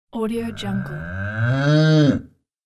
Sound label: cow munch indoor; fxprosound